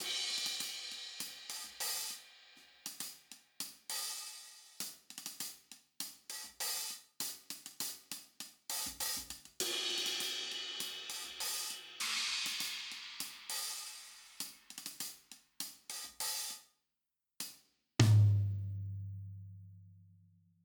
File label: COMPRESSOR HIHAT TOM CYMBAL ON